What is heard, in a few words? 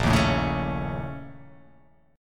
A sharp Minor Major 7th Double Flat 5th A sharp